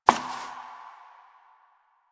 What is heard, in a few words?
Step Perforated Metal; Warframe; Koumei